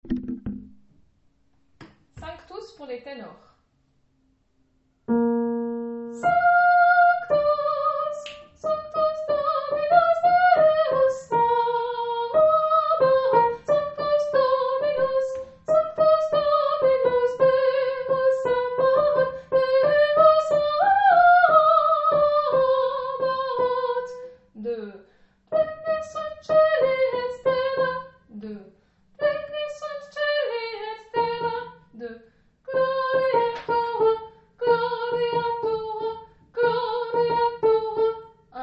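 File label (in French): Haydn sanctus ténor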